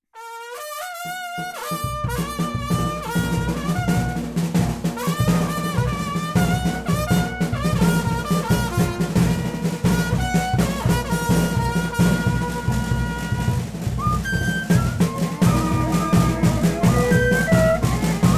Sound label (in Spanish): Toque de alabado